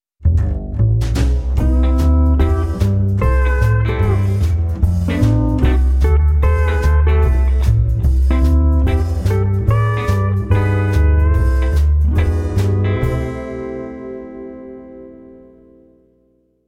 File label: Country Swing